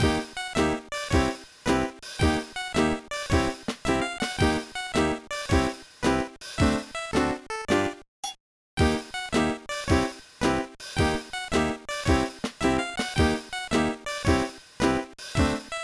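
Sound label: background music; No No No Puzzle Chailien; GBA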